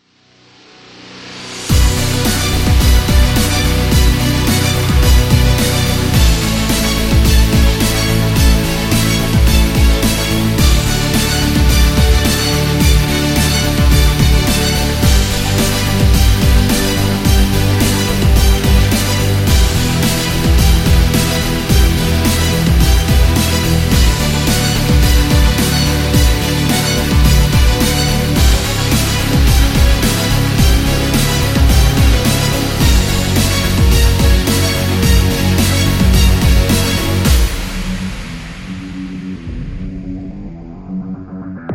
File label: Power